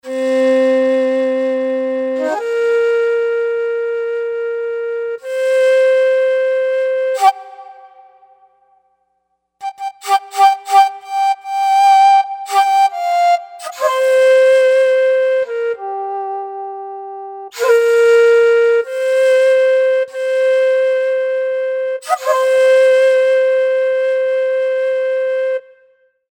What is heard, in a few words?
Shakuhachi, synth